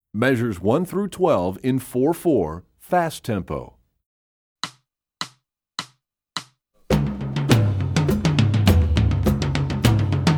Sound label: Rhythm Studies, Percussion; EE2000 Book2